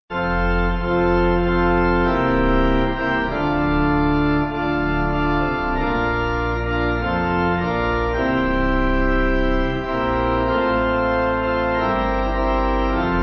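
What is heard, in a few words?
Pipe, Pipe Organ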